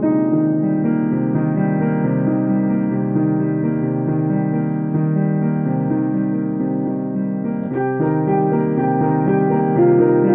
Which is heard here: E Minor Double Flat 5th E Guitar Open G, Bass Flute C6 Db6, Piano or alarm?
Piano